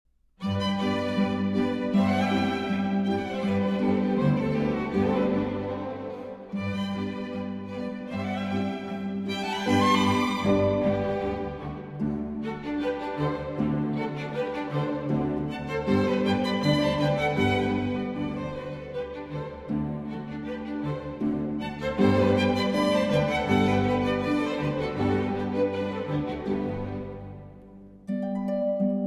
Concerto For Harp and Orchestra in G Major III. Vivace